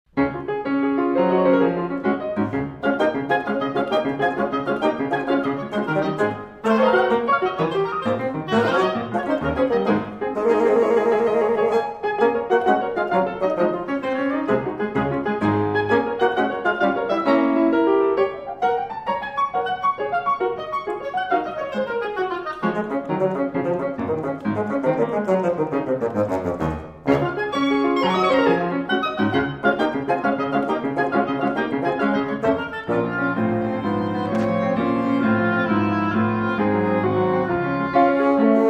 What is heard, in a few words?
trio rondo, oboe; poulenc